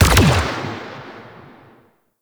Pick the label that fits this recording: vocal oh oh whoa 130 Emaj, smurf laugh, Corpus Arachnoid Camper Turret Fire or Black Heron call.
Corpus Arachnoid Camper Turret Fire